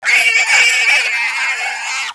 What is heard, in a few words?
pig alert